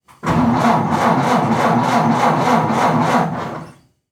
Transportation Car Ford Model A False Start Mic In Rear